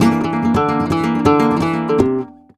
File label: Remates De Pulgar, WAV Guitar, Flamenco